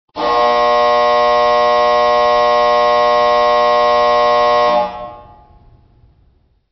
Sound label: buzzer; DSS; Pro-QMS 5.0.1